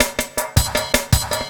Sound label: LOOP SD, Drum & Bass, 160 BPM